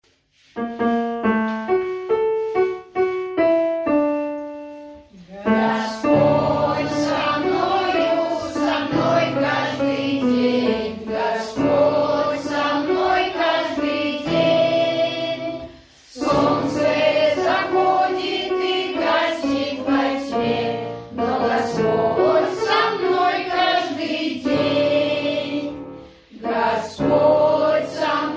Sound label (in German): Schlussgesang; aus der Kirchengemeide Tschernogorsk